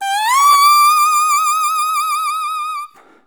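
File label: english horn; Best Service; Peter Siedlaczek's Advanced Orchestra; EH FX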